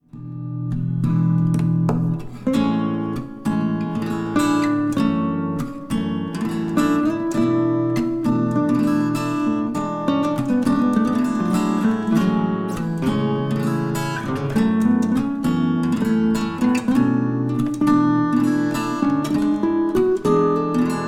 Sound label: Guitar Duo